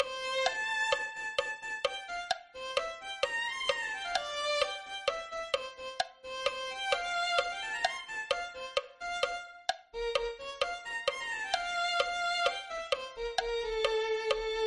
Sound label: violin